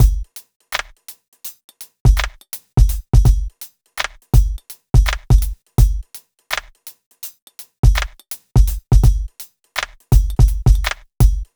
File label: Urban Beat, Drums